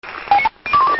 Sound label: police radio beep; doppio